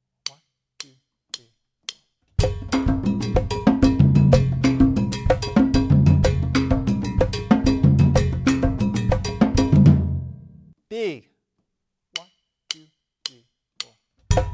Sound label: Bembe Rhythms, Latin percussion